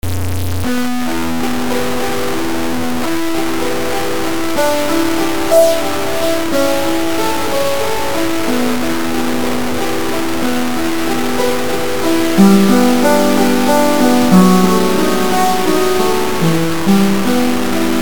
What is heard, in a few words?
piano quantized